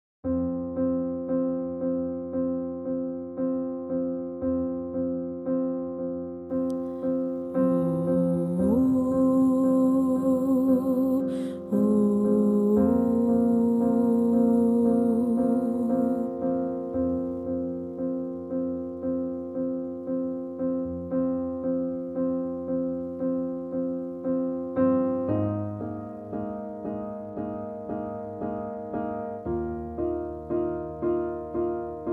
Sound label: Winter Bird Tenor